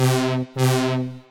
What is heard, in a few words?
diesel horn